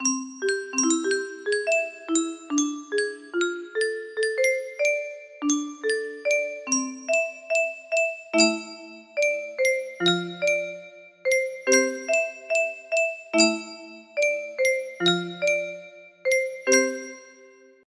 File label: yunsheng music box, melody